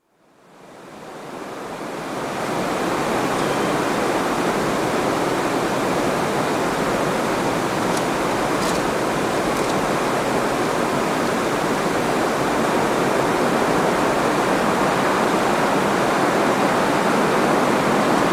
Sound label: Steady night breeze; Weather; BBC